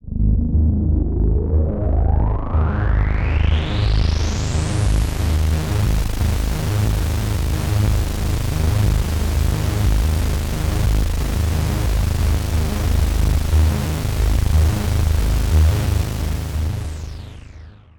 Rising Dystopia 125 Bpm

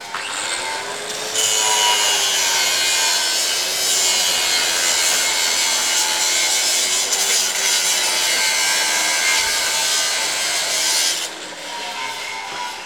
Saw Iron Eletric Near

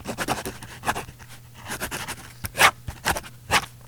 Writing Signature

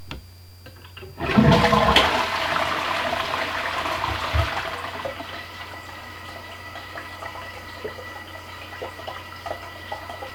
Toilet Flushing

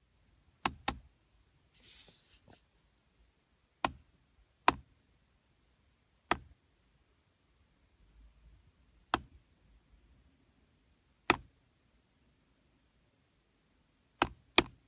Plastic Click Hit

